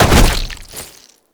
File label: Land Heavy Goo, movement; Warframe; Uriel